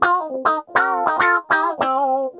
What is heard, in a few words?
GUI, LICKS